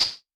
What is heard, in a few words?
HH, Vermona Mono15 Drums